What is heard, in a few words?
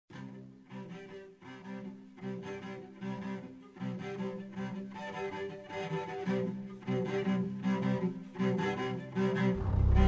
Instrumental